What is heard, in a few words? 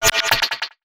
TECHNO FX 140